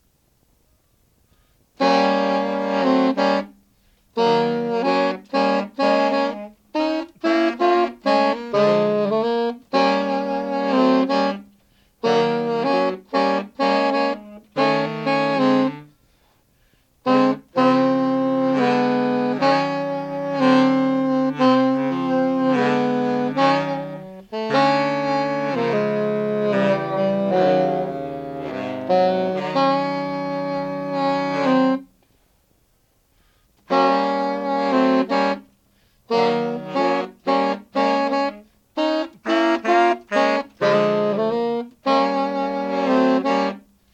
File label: horns